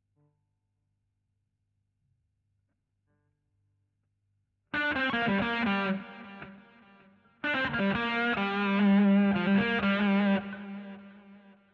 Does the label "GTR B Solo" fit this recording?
Yes